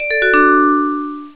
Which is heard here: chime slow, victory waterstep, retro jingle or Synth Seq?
chime slow